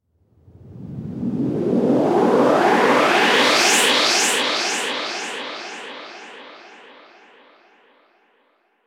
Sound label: sound design sweep white noise filter; sound effects one